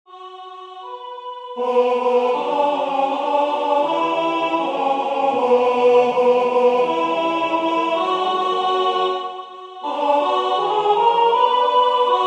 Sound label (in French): alto; Maintenant Seigneur